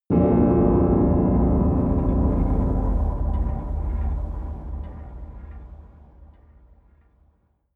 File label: dream piano